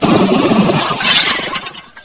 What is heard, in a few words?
die; hunger; babyslave